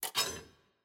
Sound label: Pin Shaft; Puzzle; Monkey